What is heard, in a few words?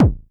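Club bass drum, Jomox Airbase99, Drum Machines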